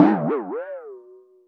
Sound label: timp; musicradar; cartoon caper